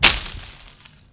bush; Mafie 1

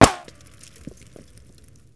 wall; Mafie 1

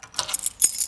key; Mafie 1

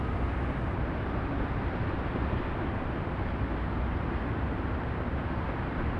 ambi town night; Mafie 1

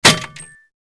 metal; Mafie 1

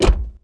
close; Mafie 1

